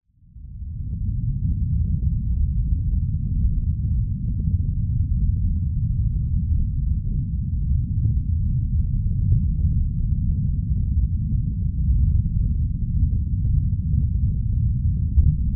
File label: nature underwater ambience deep